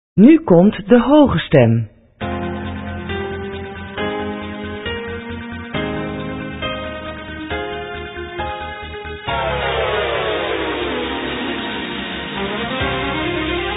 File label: sopraan